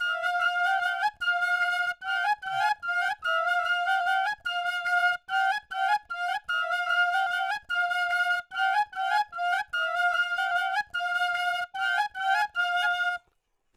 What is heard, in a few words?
BHAIRAVI 74bpm